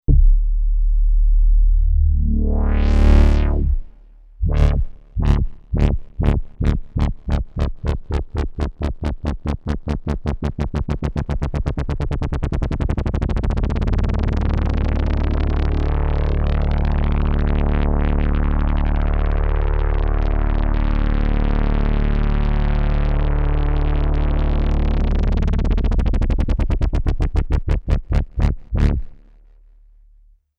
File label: LFO Test